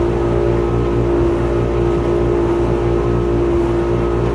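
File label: laundry ambience; hl2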